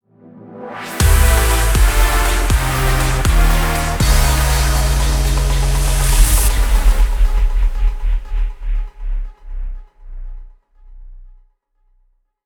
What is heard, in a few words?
Lose; Artery Gear Fusion; Bgm Lose